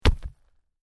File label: Rock Drop On Dirt Ground: Thud of heavy rock falling onto semi-so; Stone & Wood; Rock Sound Effects